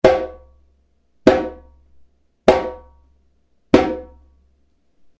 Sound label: Djembe Slap